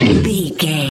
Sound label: troll monster hurt pain short